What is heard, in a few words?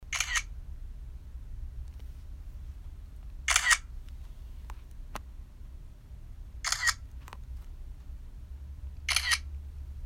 iPhone camera